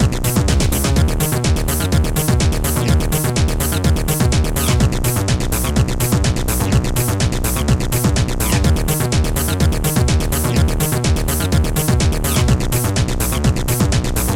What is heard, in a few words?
map tune